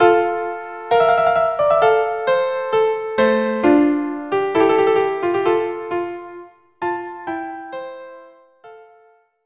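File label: violin duo; Vigilance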